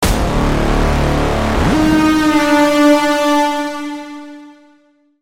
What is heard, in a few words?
Braam, Serum 2; Braam - Pacific Realm; Routed Sound; Serum 2 Expansion - Quantum Enforcer Vol.1